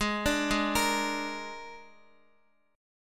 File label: G sharp Suspended 2nd Flat 5th, Guitar; G#sus2b5; arpeggiated; JGuitar